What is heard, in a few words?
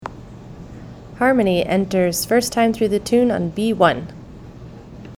La Promeneuse Harmony only, fiddle